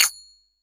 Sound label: Sagat Mid, Cymbals